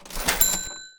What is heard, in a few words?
ch-ching